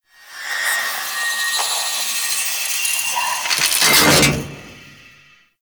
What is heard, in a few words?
Snakes; Warframe; Tau; Cinematics; DarkRefractory